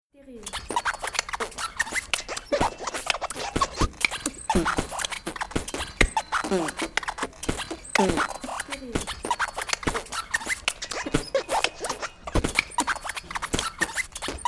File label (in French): montage bruit chaussures